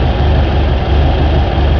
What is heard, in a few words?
CAR RUN; TRANSPOR